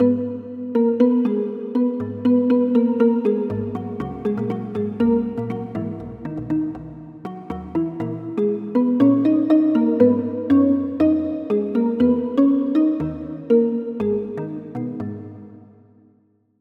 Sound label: Key